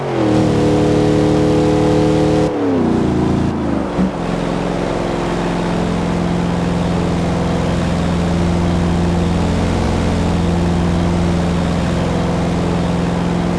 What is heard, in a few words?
fourth cruise, vehicles; cadescalade